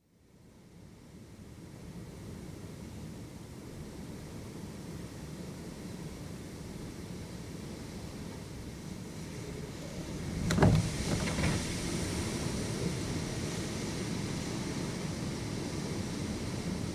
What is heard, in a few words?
Gale heard from inside house, with rattling windows; Weather; BBC